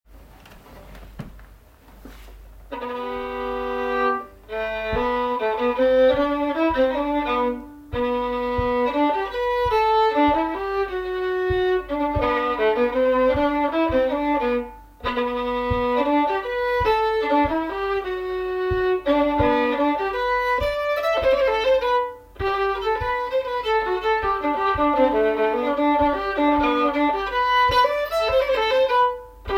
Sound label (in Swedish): Polska stamma; Polska efter Per Erik Ohlsson S vL Närke210